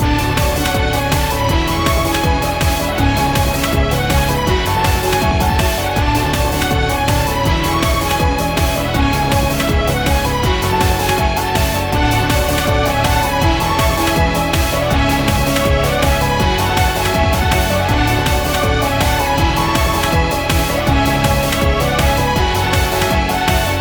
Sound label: invincibility